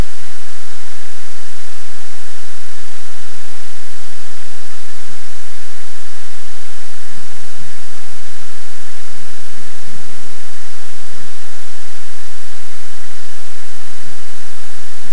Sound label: Rain